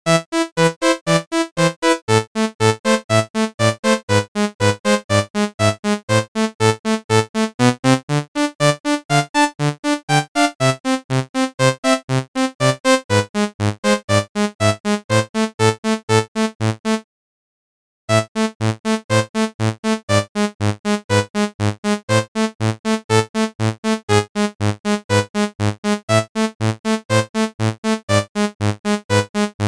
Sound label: tetris midi